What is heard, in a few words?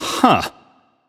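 nonverbal confused; voicepack; smite; merlin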